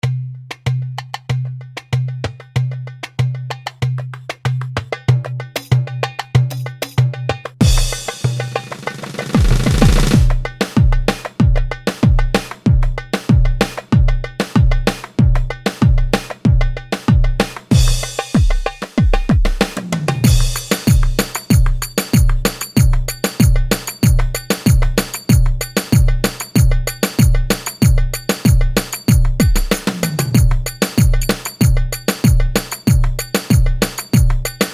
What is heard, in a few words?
drum perc; gw8 drum kitler ile fl9